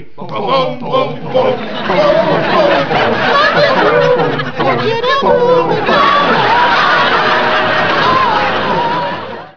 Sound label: groove